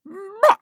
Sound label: Assassin Death; Sly Scoundrel; Realm Royale